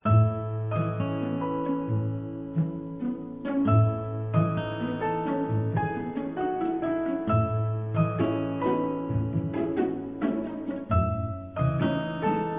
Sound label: restaurant, bgm; Delicious Emily's True Love